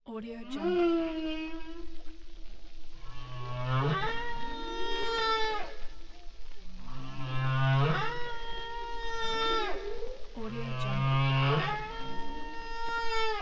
underwater whale

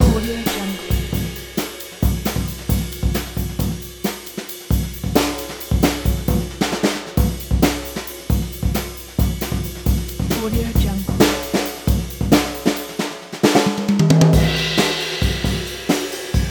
the jazz drums

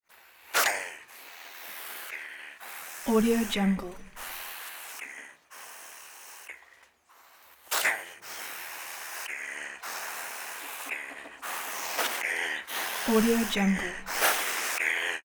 spider vocals hisses yells and yelps breathing

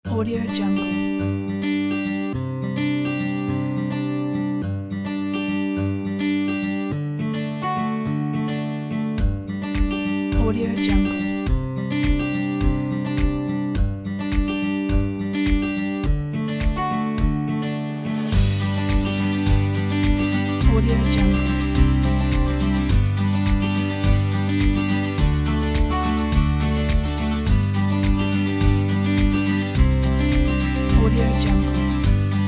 acoustic and happy; audiosky

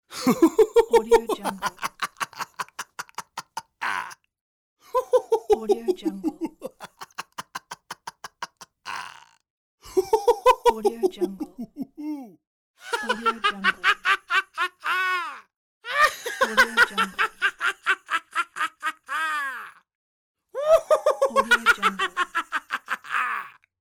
young male crazy laugh pack

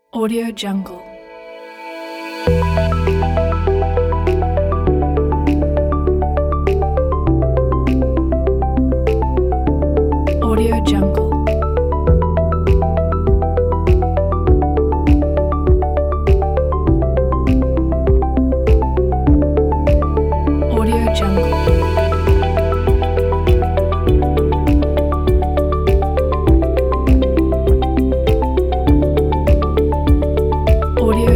the documentary background music

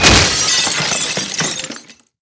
glass window pane break large; rupture; soundmorph

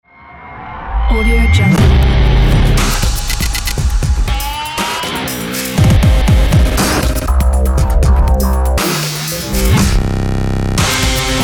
industrial urban breakdown

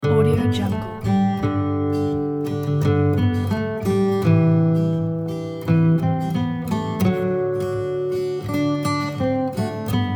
jingle bells acoustic kit; prestosound

